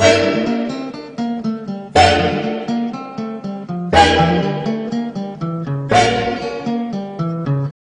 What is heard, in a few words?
guitar easy, Jazzmatazz loops